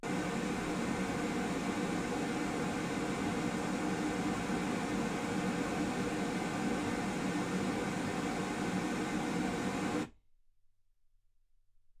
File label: pink noise 48kHz